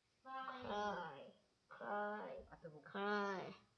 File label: cry